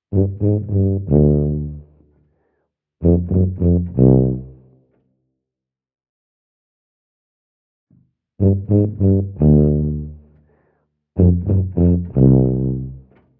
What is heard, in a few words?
Tuba Solo